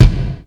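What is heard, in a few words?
GATE KICK, HR-16; Hollywood Edge; The Big Bad Beatmachine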